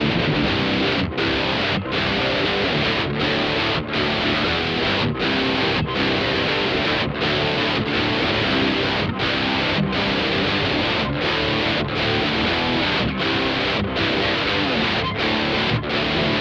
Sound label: GTR 6db Boost Q 1.0; EQ